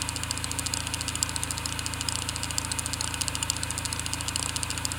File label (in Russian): Тестовый сигнал для балансировки